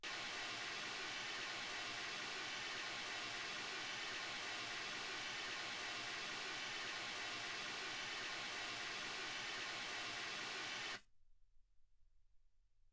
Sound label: mls 48kHz